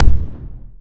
smg shoot giant

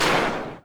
dash; ultrakill

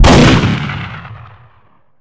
stormbringer shoot

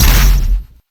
player dash

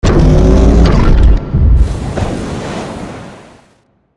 waterstep; termination; goliath

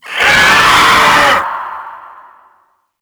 screecher

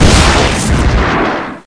wasp launcher fire

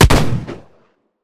deagle shot; mag

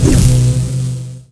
swing; holo gunslinger

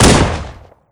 rail smg fire

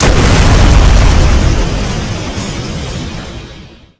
exploder big explosion

